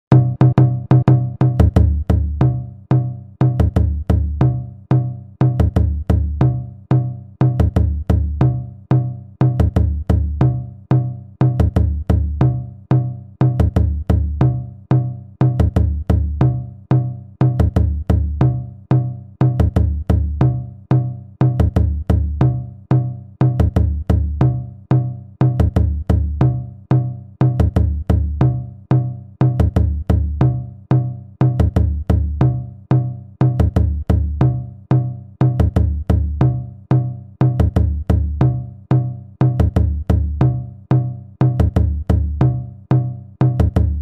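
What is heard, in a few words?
zebola higher tempo, djembe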